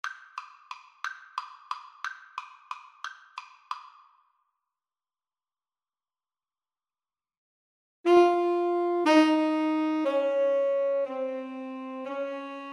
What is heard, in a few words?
Alto Tenor Sax Duet